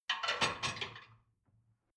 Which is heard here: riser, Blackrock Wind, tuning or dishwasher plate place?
dishwasher plate place